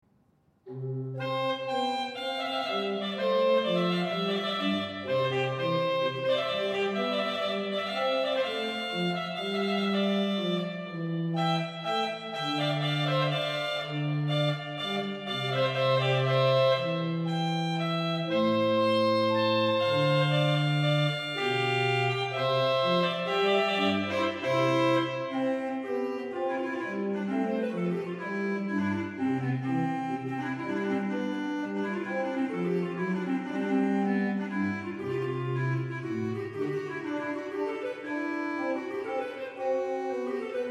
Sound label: Trumpet, C major; Bishopsgate; Opus 7 No 2